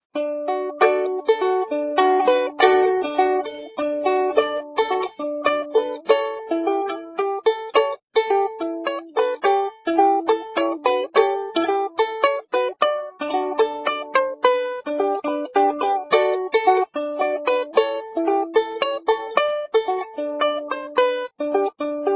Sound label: Guten Abend gute Nacht